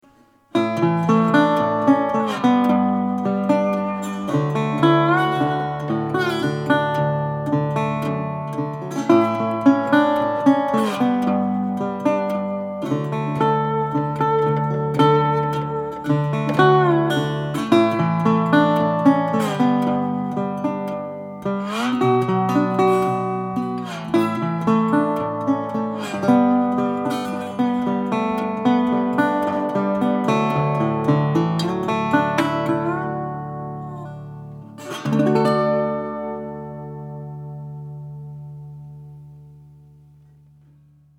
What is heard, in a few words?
Dobro